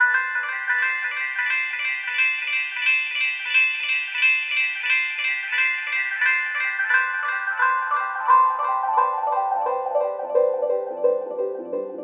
174 G Piano Repeats; Shower

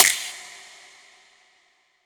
Snare

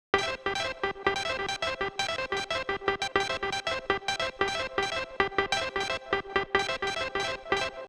Weird Arp 122 BPM

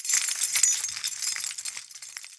fx one shot keys

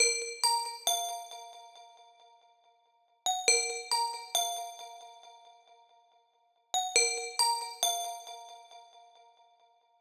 A mn 138bpm bell; Drippy Drill Melodies

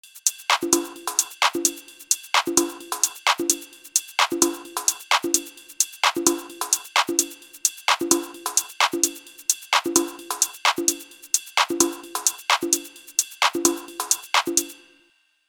Top Loop

